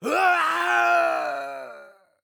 Falling; Paladins; Owl

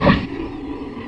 weapons grunt; hero snapfire mortimer; Dota 2

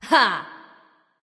laugh; Artemis; Recon; Smite

